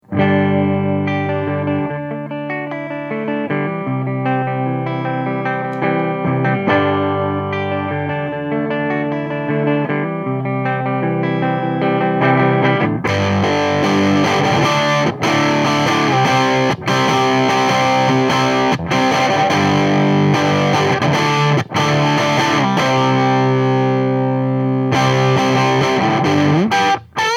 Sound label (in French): Canal 1 mode triode (SH1 neck), puis canal 3 à fond; Canal 1 mode triode (SH1 neck), puis canal 3 à fond (d'abord SH4 bridge, puis SH1 neck sur le solo); White Jack, un 3 canaux; AMPLI